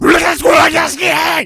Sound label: rage at victim, infected, male